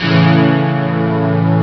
stack; ST-XX Amiga Soundtracker Sample Packs

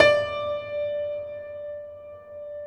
PED FF, GRAND PED

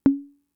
Conga, Fricke MFB-522

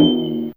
Cro Timp A4, Fairlight CMI IIe; Effects3

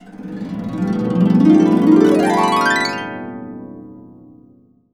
B CASCADE UP, Harps; Complete Harps; USB Soundscan vol.62; AKAI

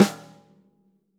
snare snares on; JazzFunkKit

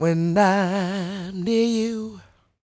croon, Vocal Planet Blues Soul; Spectrasonics

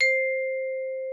XYLS C5, VIBES; Xsample VOL-4

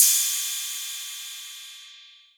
808 Cymbal; Drums

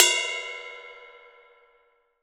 CUP, RIDE

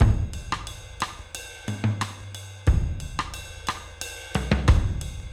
Drums 90, Loops n Lines, 90bpm

